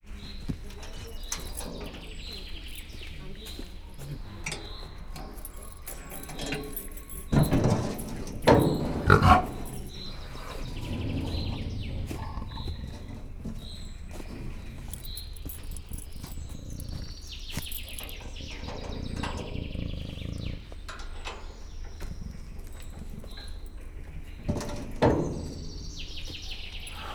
nőstény állat eredménytelen kiengedése, leopárd jaguár; szegedi zoo 2011

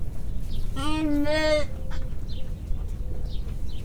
1 hónapos juh; Hortobágyi Nemzeti Park 2013

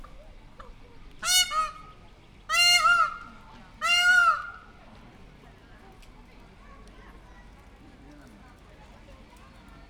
páva; pecsi zoo 2017

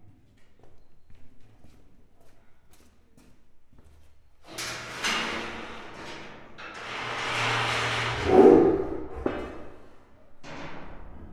etetes racsozatvarakozas, bengalitigris

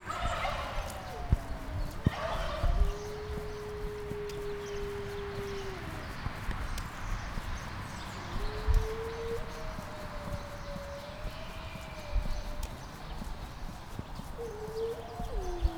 farkas távolról szél; állathangok; nyíregyházi zoo 2010

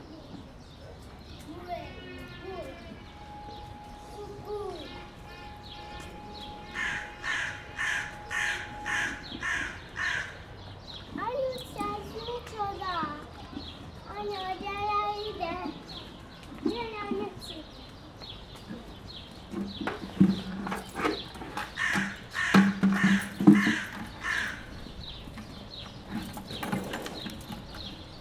az orvosmedve kifutonal, szurke varju; debreceni zoo 2012

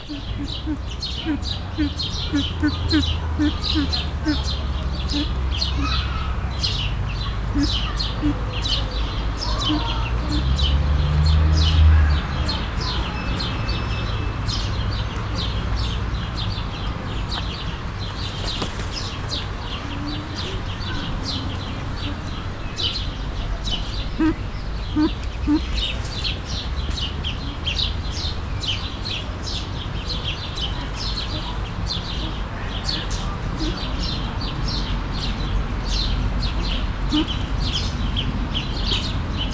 dünnyögő hang, ezüstfácán; kecskemeti zoo 2016

